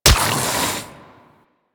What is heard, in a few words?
Tendrils Embed Hit; Warframe; Warframes